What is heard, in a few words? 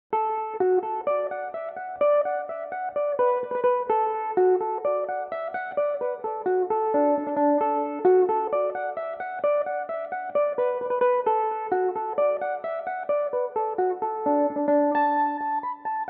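D major, irish mandolin, tunes; sailors bonnet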